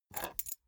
key ring small grab table

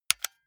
console controller xbox rt press fast

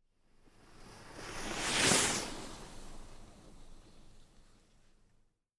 skiing increasing speed

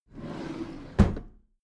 packed drawer smooth close regular

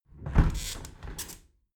window close regular sequence

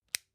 flashlight push in

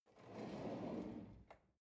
drawer cutlery draw in

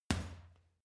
boxing punching bag uppercut medium

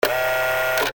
energy and rays

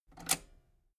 metal button robust spring push disable

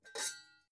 pots pick up

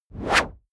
whoosh noise sweep hit long

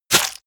hit blood light

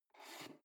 foot switch dimmer slide down

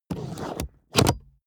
plastic draw lock

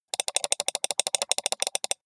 computer mouse click sequence steady

